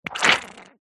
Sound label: cartoon squelch